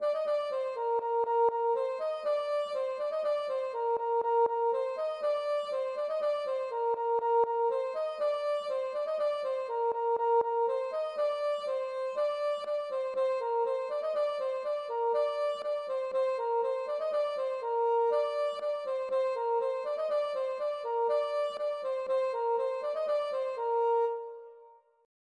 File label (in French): rond Landeda Trad